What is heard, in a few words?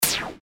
shot; ショット1; 全てシンセサイザーで制作したアナログ効果音です。